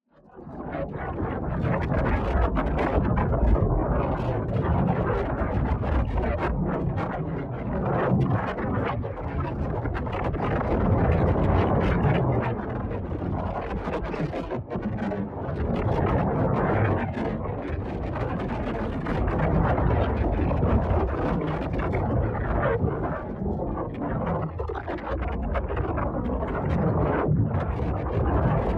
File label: ABILITY CHARGE Fluctuating Field; boom library; Matter